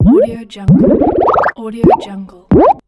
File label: pops